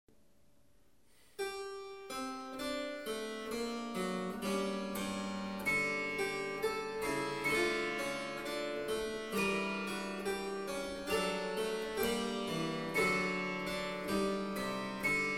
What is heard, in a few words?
harpsichord